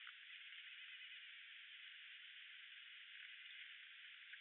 ui 4t color 1600 to 3400, birdlike, tivoli bay sounds